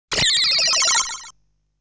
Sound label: Power up item sound; legend of hero tonma